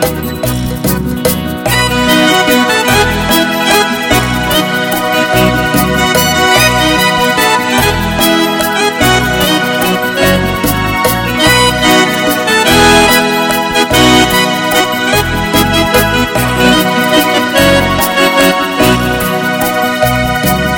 Pretty and Happy Song

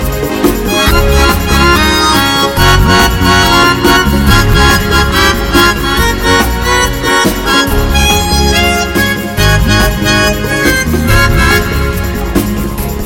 Happy Moon Swing

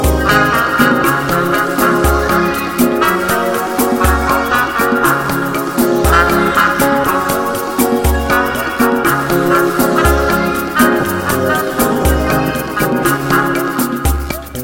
A Pretty Unplug Song

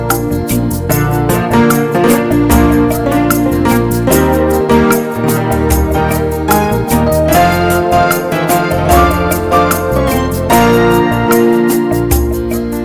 Slow and Light

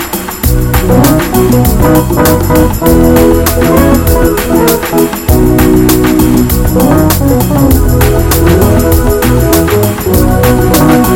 Smooth and Pretty

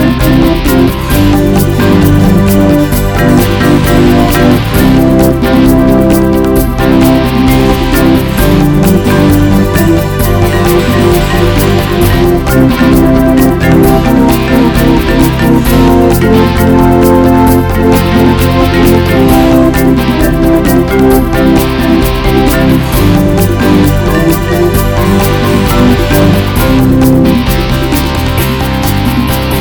Song Loved by Kids

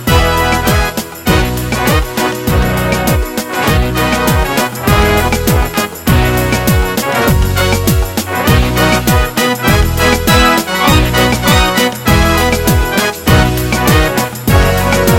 Beautiful and Happy

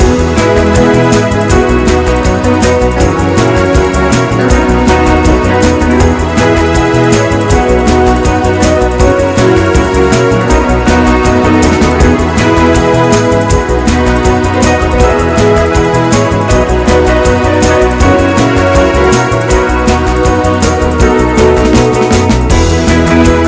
Fast and Pretty

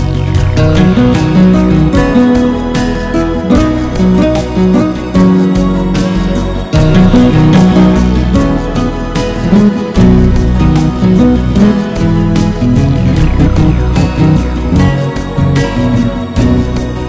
Slow and Very Pretty

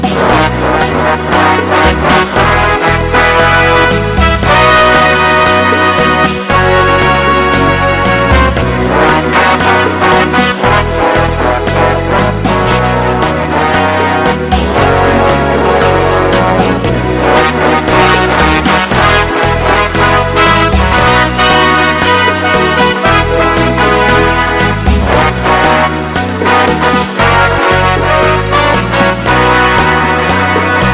Great Dancing Tango

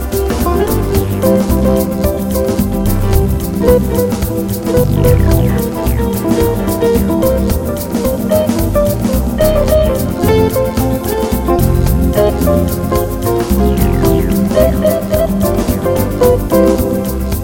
Jazzy Afro Cuban

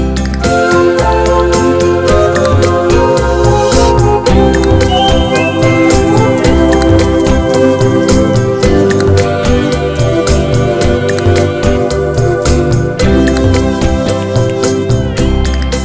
A Sweet Bolero

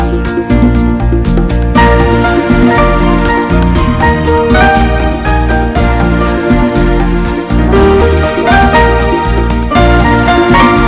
Slow and Pretty

